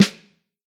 Vintage Snare, Snare Drum; Q Up Arts; Johnny.C's Vintage Snare Drum; 6X14

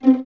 Violins Pizz C; 11 Violins; Miroslav Vitous - String Ensembles

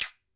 ZILIES CLOSE

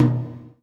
GATE TOM, Drums Acoustic

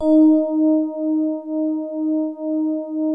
COND SOFT D#3, E-PIANO; Music Store - Claudius Diemeter Schwerlos

